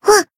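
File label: damaged, Japanese; nagi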